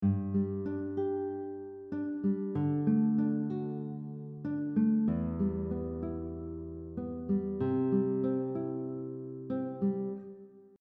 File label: FIngerpicking pattern 2 arpeggio up then down, guitar; 4/4 time